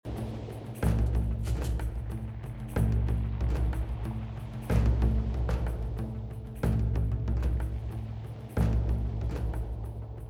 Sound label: pirate background music